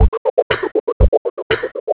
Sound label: loop